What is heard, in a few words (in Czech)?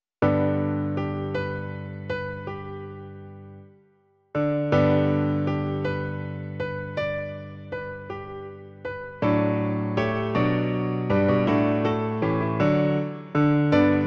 Narodil se nám Spasitel - klavír; Klavírní verze